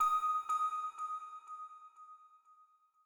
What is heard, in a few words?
Calm; super mario maker 2; Peaceful SFX Raws - Otoasobi Calm SE